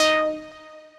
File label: Lead Hit, Dancefloor Pop; Remixlive